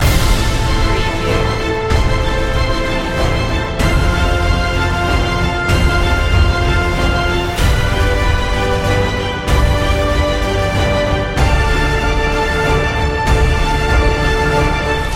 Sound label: hit hard loop